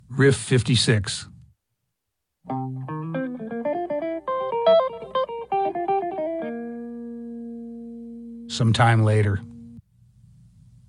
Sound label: Riff